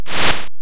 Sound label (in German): skiing tor